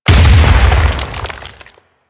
Barrier Collapse; Warframe; Brawler

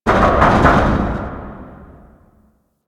Ghost Cargo Knocks; Ambience; Props; Warframe; The New War; Zariman; Quests

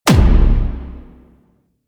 Concrete Archgun Punch; Warframe; Archwing

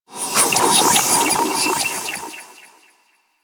Bark; Warframe; Teralyst; Vomvalyst

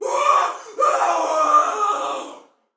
Death Fire, Female, Soldier; Dialog; Barks; Battle; Sinecure

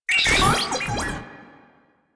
Tank Airburst Chirp Spawn; Enemies; Weapons; Warframe; Lasrian

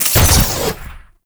Mech Jump Boost Start; Nechro

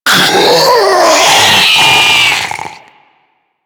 Infested Diseased Ancient Death; Warframe

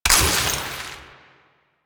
Ammo Pickup Corrosive; DE; Warframe; Warframes; Frumentarius; AmmoAbility

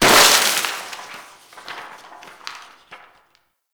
Desktop Book Destroy; Warframe; Ambience; Entrati

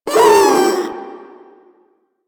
Proto Infested vocal short attack

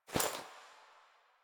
Body Move

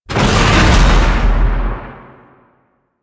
Void Dragon Chomp; Enemies; Abilities; Warframe; Duviri

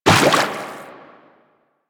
footstep water; Enemies; Warframe; Teshin; DE

